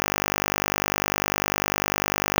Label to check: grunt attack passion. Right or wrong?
Wrong